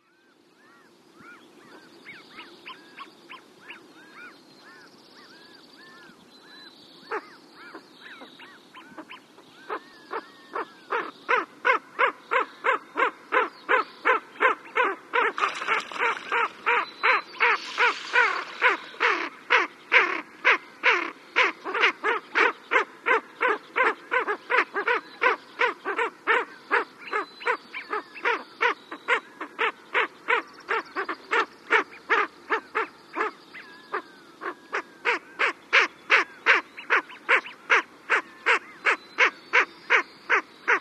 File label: Ring necked Duck